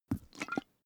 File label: hot-water bag hit